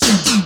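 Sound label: DRUM HIT; Best Service Advanced Media Trax Building Blocks; FAT ROCKA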